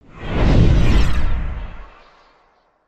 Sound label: Whoosh